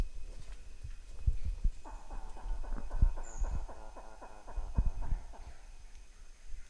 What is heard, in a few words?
Blue Monkey Hack; vocalisations; compcog